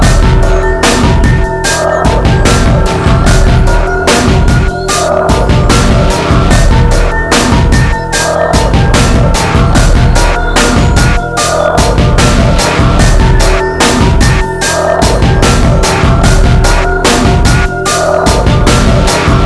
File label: mansion loop; svencoop; blackfacility